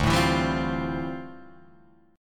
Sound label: D Minor 11th D down Guitar Drop D